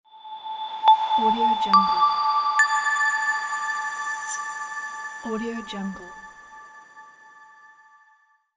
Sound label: short logo